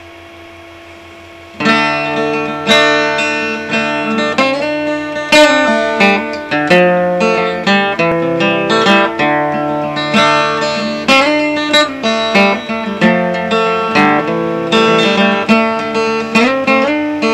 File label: impro irish